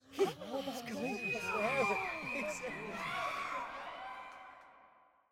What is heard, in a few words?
crowd Reaction jungle big Reveal; spellbound